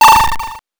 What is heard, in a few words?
randomize, 8 bits